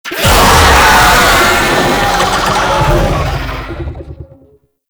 Infested Vocal Attack; Warframe

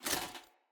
Foley Action Armour Walk; Characters; Mother Armour

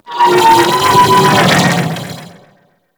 Vocal Death; Warframe; Amalgam Override

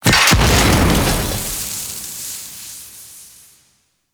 Barrel Gas Explosion; Warframe; Corpus Gas City; Gameplay Remaster; Ambience

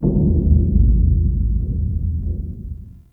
Distant Metal Bang; Ambience; Warframe; Corpus Railjack

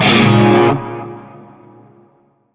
Shawzin Phrygian Chord L; Warframe; Narmer Shawzin

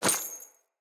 Crate Coin Drop; Objects; Pickups; Neathuns

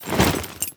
Generic Foley; Warframe; Temple

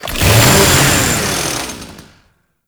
Ghoul Saw Melee Swing Long; weapons; Kuva; Warframe

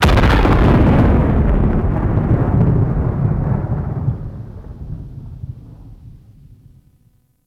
Explosion Space Battle Distant; Ambience; Warframe

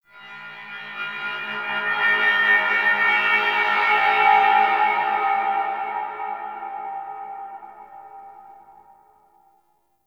Distant Sentient Drone; Warframe; Tau

